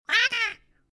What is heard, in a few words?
cute angry birds, sms; ringtones